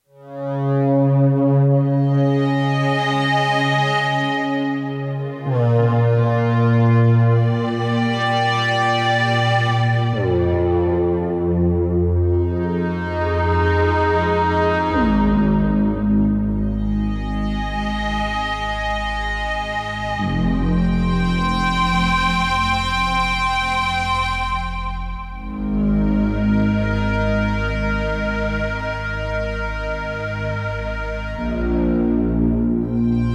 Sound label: Korg Prologue